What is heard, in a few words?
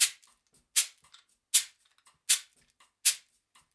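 shaker, Percussion Loops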